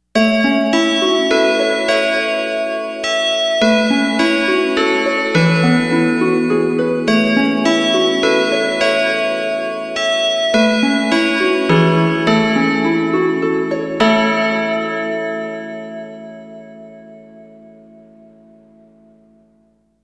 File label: chime; denon